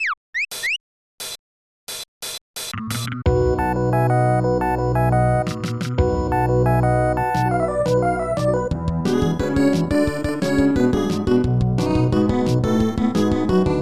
Credits (No Clapping); Super Game Boy 2; SNES

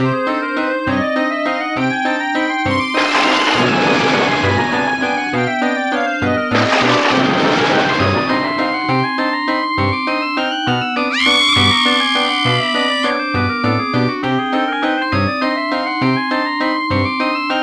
cinematic haunted house; Theme Park

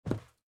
land wood

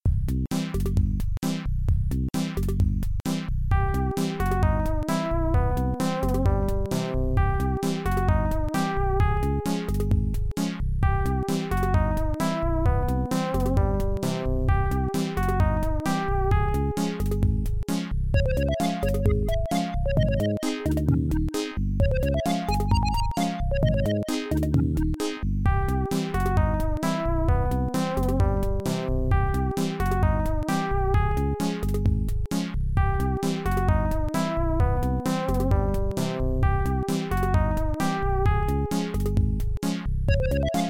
Rainy Forest (Adlib); Lollypop; MS-DOS

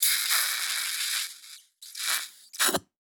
plunger suction wet pop

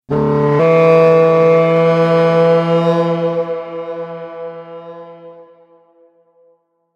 Battle Horn; Middle-earth Shadow of Mordor

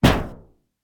Barrel Break

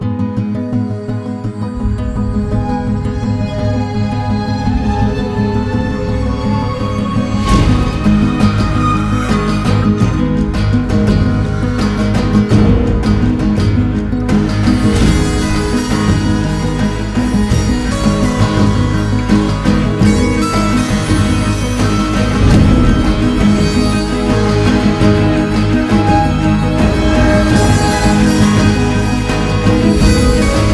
Zombie Decay; State of Decay

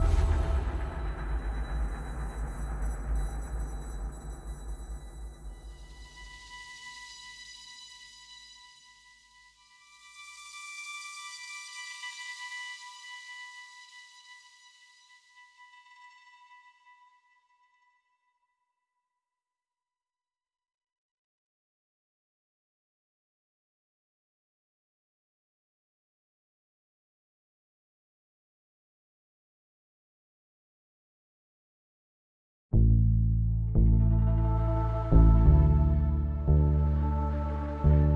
Reactor Mix Stem Layer

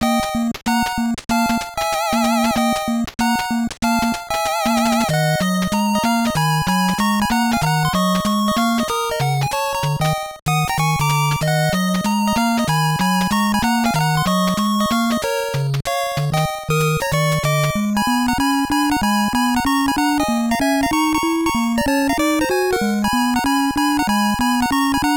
Magic House

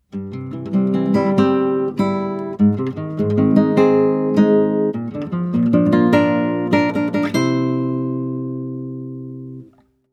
Course Clear Fanfare, guitar; Super Mario Bros.; super mario series for guitar 2010

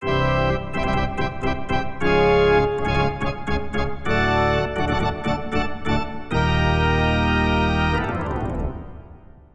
Organ Steps; gamerip; Backyard Hockey

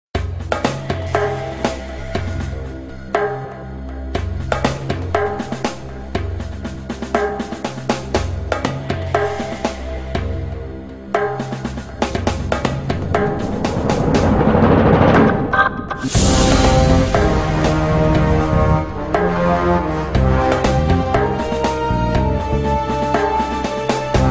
Loading Screen Music; ufo aftershock

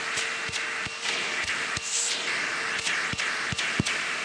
Vacuum

